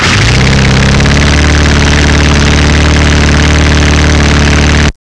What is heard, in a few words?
lawnmower